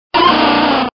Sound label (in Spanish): Grito de Dunsparce